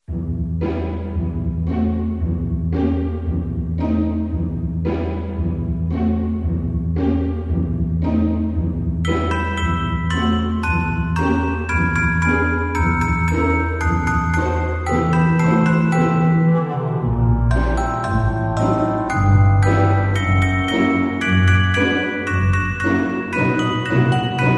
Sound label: halloween castle bgm